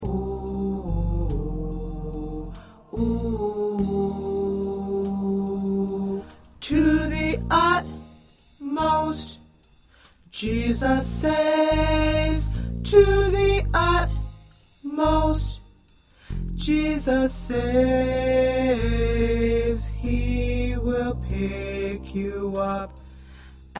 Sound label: Tenor, choir